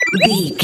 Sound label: collect item sparkle pop